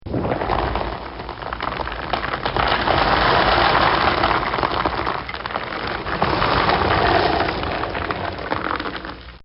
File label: A Car On Fire; Cars; Vehicles